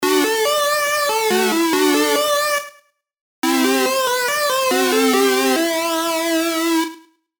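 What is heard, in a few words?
Serum, lead; Stage Select; CHIPTUNE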